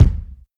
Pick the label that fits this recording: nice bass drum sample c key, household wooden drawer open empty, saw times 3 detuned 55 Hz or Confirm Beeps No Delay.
nice bass drum sample c key